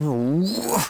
Man Frustrated; Zomboid; Lifestyle

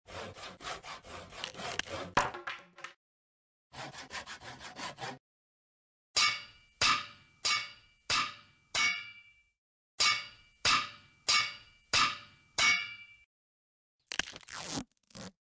Big Scrap Pickaxe Crafting; Scrap Weapons; Zomboid

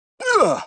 Man Fall; voice; Zomboid; Lifestyle

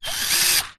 cordless drill swing; Zomboid; The Workshop

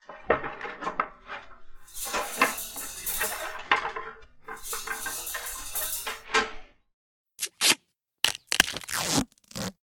Wire Bat Crafting; Scrap Weapons; Zomboid

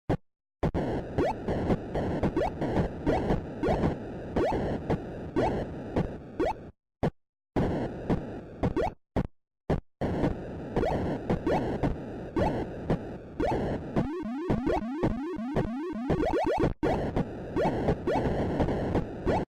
Space invaders game Atari; Zomboid; Video Game Consoles

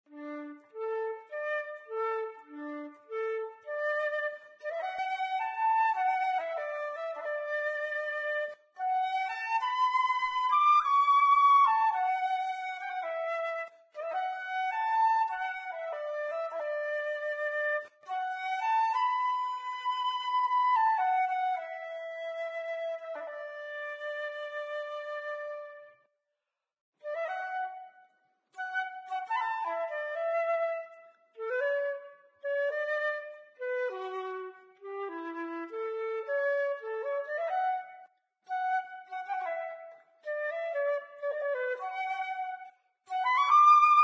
Flute; Zomboid; Lifestyle

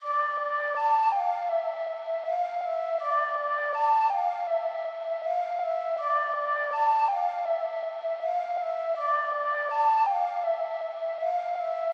flute; Zomboid; EntertainYourself

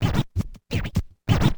scratch, DJ Booth; effects

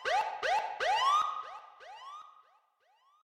police helicopter siren; crashes bombs misc; Zomboid; Expanded Helicopter Events

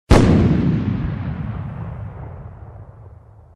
bombing; Helicopter; crashes bombs misc; Zomboid; Expanded Helicopter Events

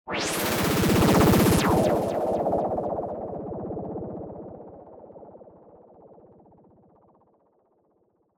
synth, DJ Booth effects

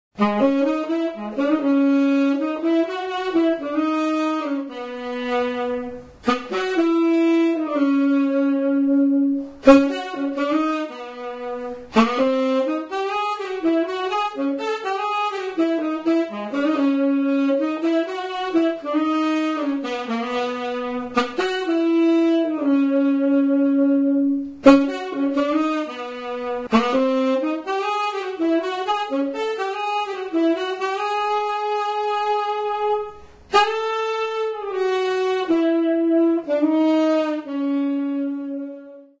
Saxophone; Zomboid; Lifestyle

